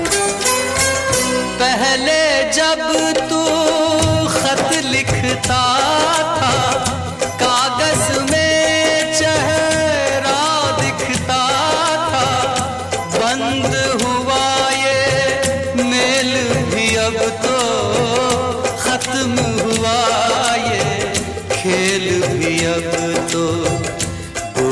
Most Sad Ringtone

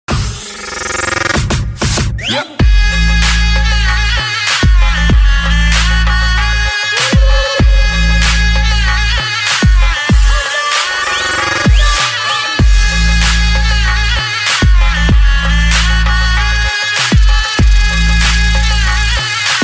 Arabic Trap Ringtone; ringtones

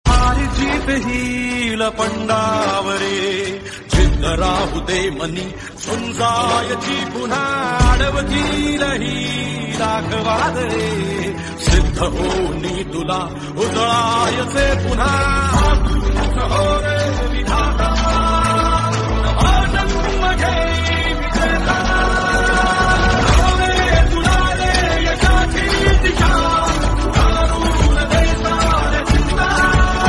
Marathi Song Ringtone

